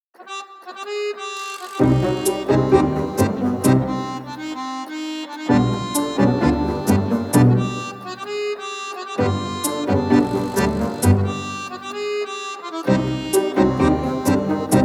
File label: tango